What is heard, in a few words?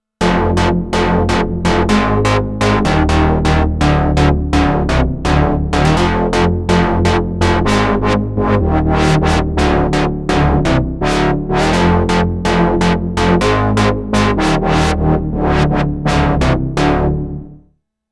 Industrial, korg; dense